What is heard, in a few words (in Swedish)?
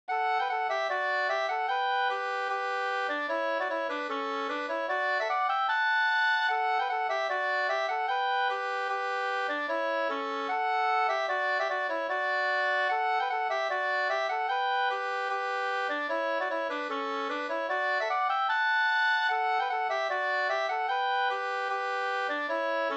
schottis; Rattvik